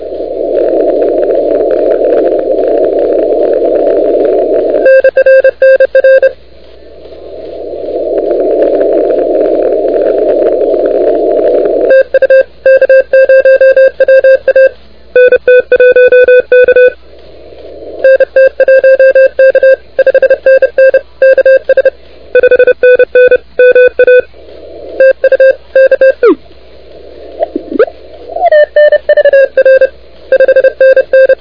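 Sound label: continuous wave 28MHz; ARRL10M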